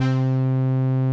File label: 505, String Machines